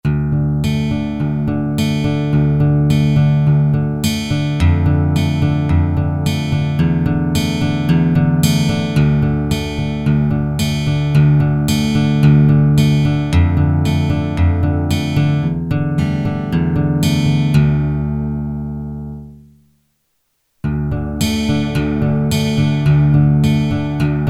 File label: Flanger; t.c. electronics M300